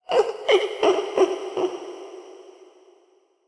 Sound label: ghost laugh